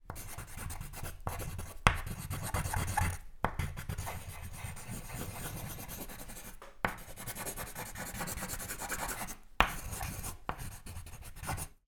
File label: office drawing colouring with pencil